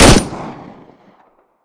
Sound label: browning fire, weapons